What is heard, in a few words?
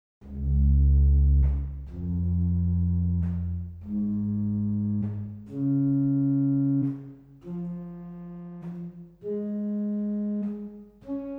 Ped Octave arp, organ stops; Silbermann middle-sized church organ; 8' Octaven Baß; Reinhardtsgrimma; St. Anne